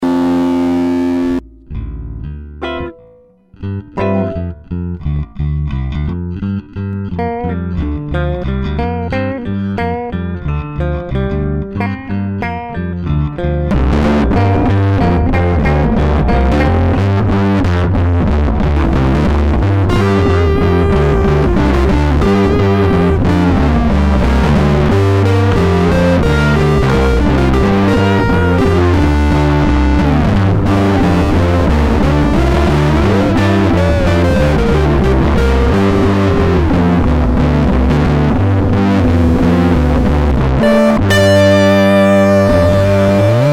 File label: p416b GT308b Fuzz; Fuzz Tone List